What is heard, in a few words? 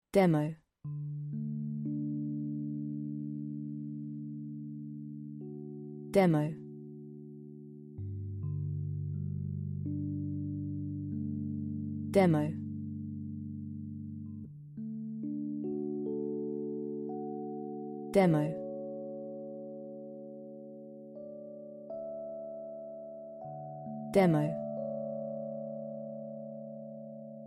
Vibes Elec Piano; Documentaries